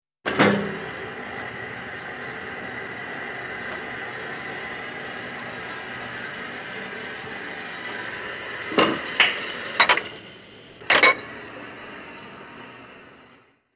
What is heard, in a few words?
Industrial machine running